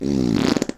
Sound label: FART; Dino Shift 2